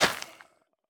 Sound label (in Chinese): 灵魂土破坏声